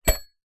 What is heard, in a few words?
household alarm clock with bell set down carpet